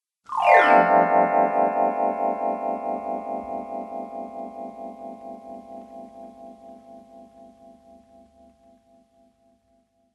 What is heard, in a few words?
Vibraphone